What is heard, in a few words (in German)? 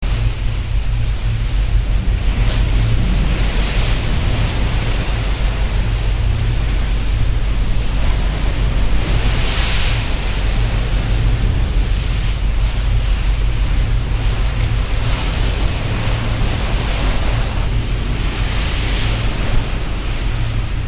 Deck Rauschen